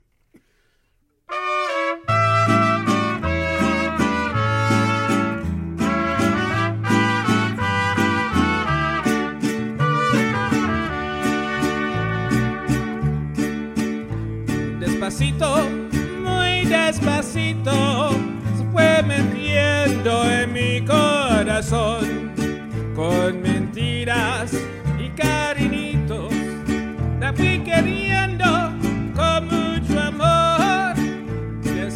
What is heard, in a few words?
Mariachi